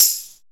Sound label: LOOSE TAMB, TAMBOURIN